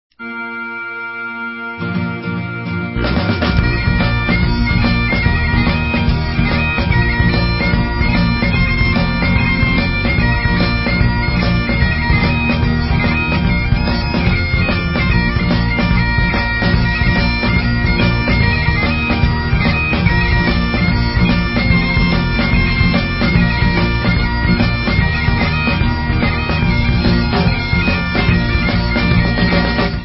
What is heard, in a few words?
Banjo Breakdown